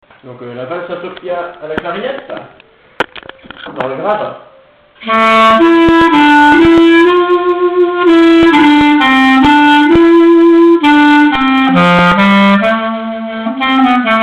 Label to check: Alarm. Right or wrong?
Wrong